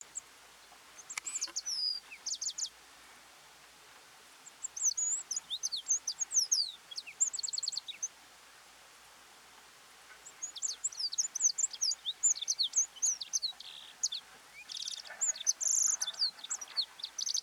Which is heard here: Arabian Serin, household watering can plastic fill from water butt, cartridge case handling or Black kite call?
Arabian Serin